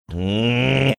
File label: groaning